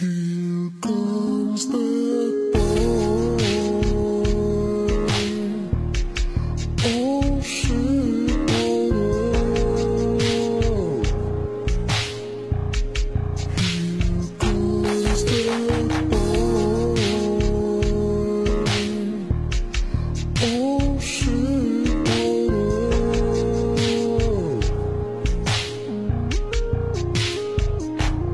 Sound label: Vaporwave; Dat Boi